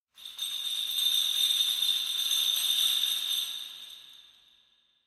Kagura Suzu

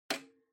Portable Stereo Earphones Unplug

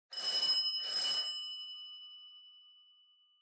Doorbell Mech Bell, Multi Far; ドアベル 機械式 ベル01-7(マルチ 遠い)

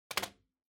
Calculator (Put)

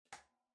Electric Fan (Far-Switch-Swivel)

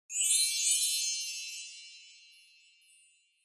Wind Chimes, Fast Up High

